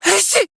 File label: Sneeze; Fable Of Garren Rood; Avakot